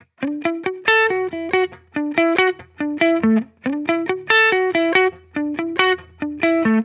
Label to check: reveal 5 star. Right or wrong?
Wrong